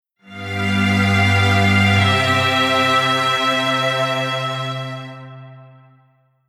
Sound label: Strings